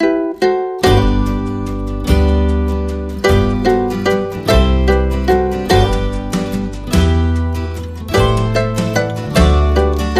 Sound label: Waltz